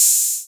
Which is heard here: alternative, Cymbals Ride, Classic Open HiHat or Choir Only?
Classic Open HiHat